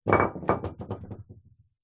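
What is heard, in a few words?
Weight Drop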